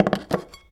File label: Lay Down Object